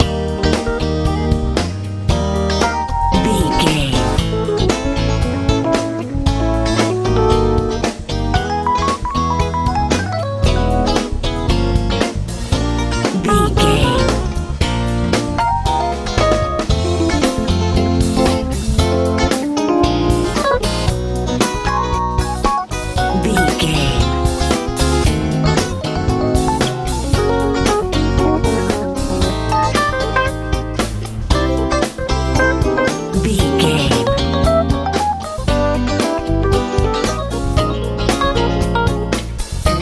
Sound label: funk soul